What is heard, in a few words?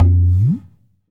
TABLA GAE; AKAI S-Series CD-ROM Sound Library VOL-5